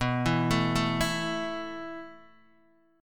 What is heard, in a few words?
B 7th Suspended 4th B down Guitar DADGAD